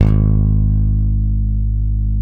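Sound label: Sadowsky 5 bass, G#1; Sampleheads; Will Lee Bass Library; SADWSKY5 SUS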